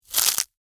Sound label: Crunch Floral Foam, Texture, Crunch, Short, Floral, Foam; Crunches (Sound Effects:Objects)